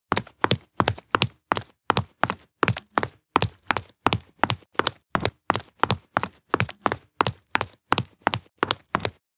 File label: High heels fast running on street